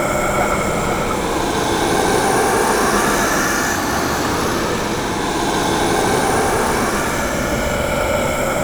Grab Soul Siphon Loop; Characters; Enemy; Mockeries; Abilities; Mockery

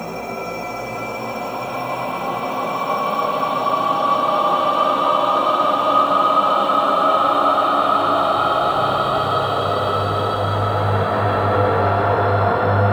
Dark Loop, ambience; Hub; Relay Reconstruction

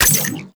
Shape Blast Shotgun Reload, Weapons; Warframe; Corpus

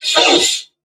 Surprised; Warframe; Corpus Crewmen; Crewman E; Dialog Barks Battle

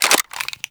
Reload, railgun; Lanka

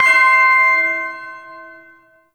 Shawzin Oct Three C; Warframe; Duviri Ersatz Shawzin

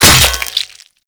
Axe Impact Flesh; Warframe; Weapons; Impacts

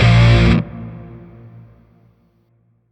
Shawzin Blues Chord H; Warframe; Grineer

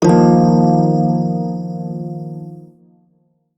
Shawzin Blues Chord; Warframe; Prime Shawzin

